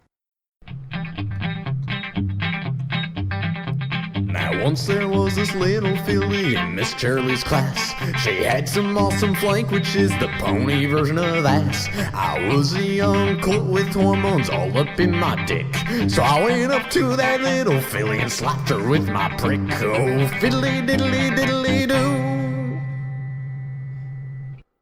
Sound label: Typical Country Song